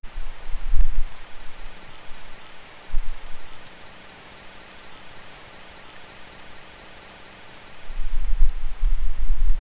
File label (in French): gouttes de pluie sur une, raindrops